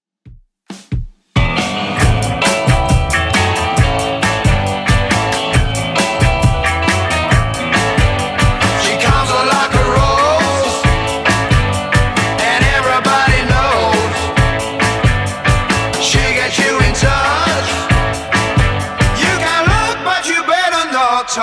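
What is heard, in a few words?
60s Redrum 136bpm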